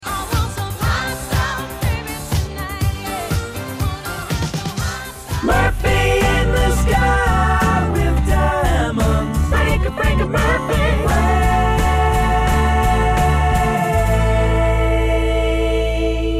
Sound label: jingle; Count Boogie; On Air